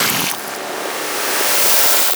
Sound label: SPLAT FACE; Spectrasonic Distorted Reality 1; SPLATTERFACE